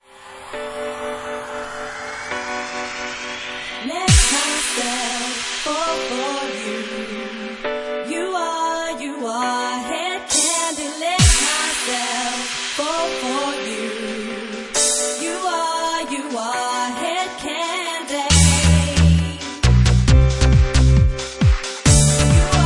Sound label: Organ Mix; HedKandi